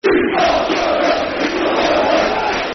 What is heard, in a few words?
Football Chant (Soccer Songs); We Are Going Up; Tranmere Rovers Trfc